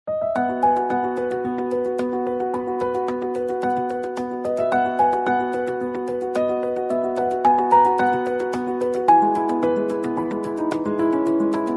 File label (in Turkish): santral fon muzikleri